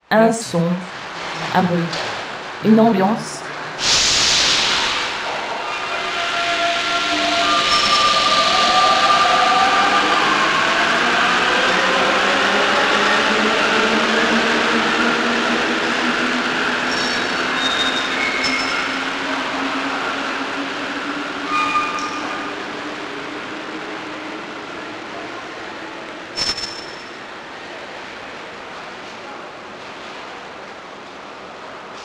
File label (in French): Un son un bruit une ambiance; Les oreilles du monde